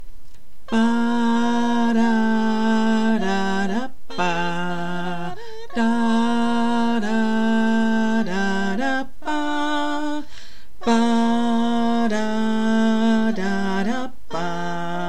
Tenor Bass; church

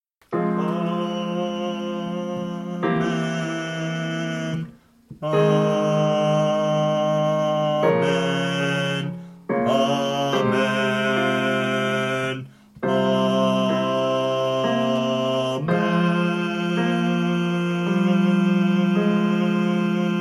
Armistice Amen - Bass, Choir

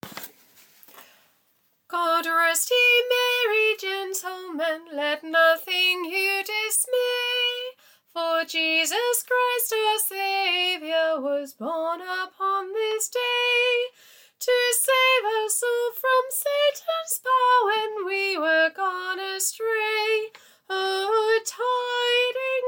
soprano, Choir; God Rest Ye; Christmas

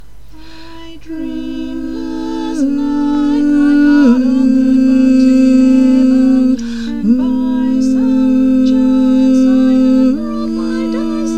ALTO, Choir; church

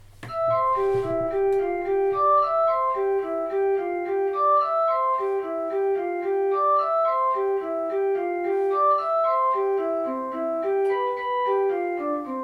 backing track

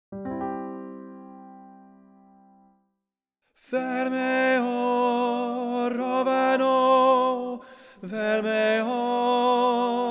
TENOR; Eriskay Love Lilt; church

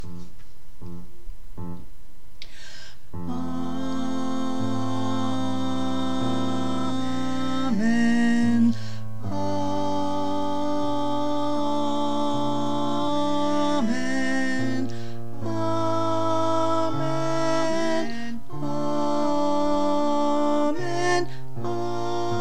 Amen Alto, Choir; Bridgewater; Choir Spring Summer 25

